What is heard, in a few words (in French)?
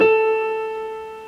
son piano La3; chapitre 08 Signal sonore frequence niveau sonore